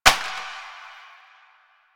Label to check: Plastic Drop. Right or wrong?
Right